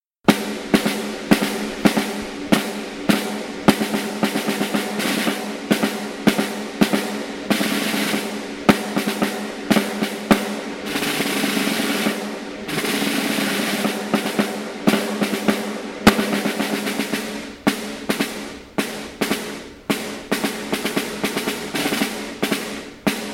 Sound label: percussion snare